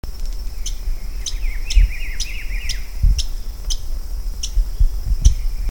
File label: Phylloscartes ventralis